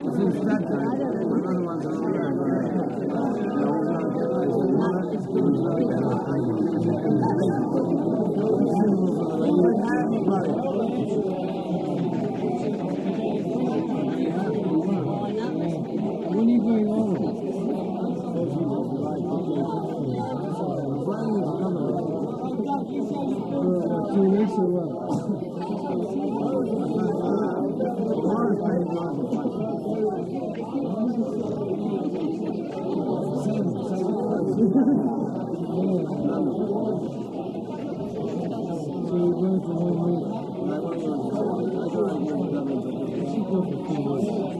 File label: Bar Muted Walla Atmos; bars & discos